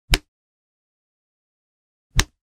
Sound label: Fist Catches; Hollywood Edge; Martial Arts and Human Impacts